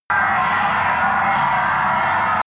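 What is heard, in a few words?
scrape wind reverb, science fiction; sciene-fiction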